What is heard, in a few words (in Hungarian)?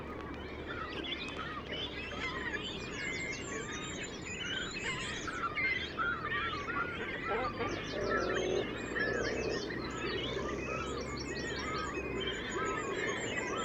nagy karokatona hangja